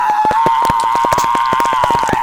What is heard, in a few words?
pop scream; htf